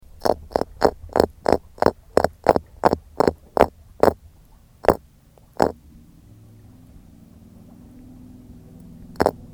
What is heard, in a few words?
Orthopristis chrysoptera; Carolina fishes